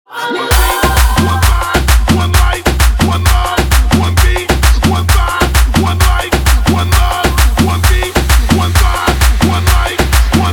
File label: intro outro 131 bpm